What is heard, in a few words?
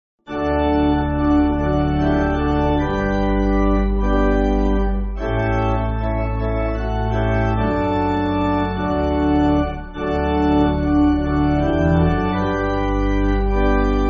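Pipe Organ, Music Backing Files for Churches; O Distressed Soul; German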